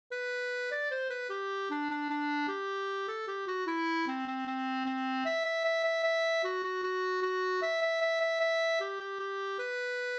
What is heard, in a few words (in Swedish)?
vals